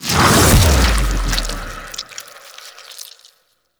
Blades End; Warframe; Garuda

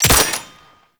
Belt Fed Rifle Low Clip; Warframe; Tenno; Frumentarius

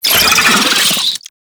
Wall Climb Movement Vocal; Warframe; Oraxia

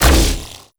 Contamination Wave Proj Impact; Warframe; Focus; Madurai

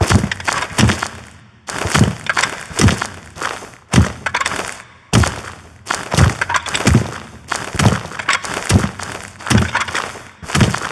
Fight Intro Foley; Cinematics; Ballas

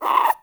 Exterior Forest Critter; Duviri

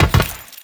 Land Metal, Footsteps; Enemies; Warframe; Teshin

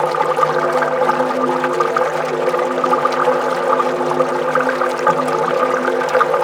Ocean Cloning Gurgle Loop; Ambience; Props; Warframe; Grineer Ocean

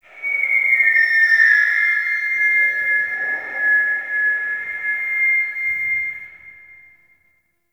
Interior Stadium Radio Interference; Nineteen Ninety Nine

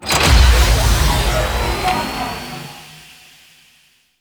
Interior Orbital Station Elevator Start; Warframe; Tau

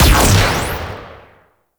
Shotgun Shot 3D, Weapons; Warframe; Corpus

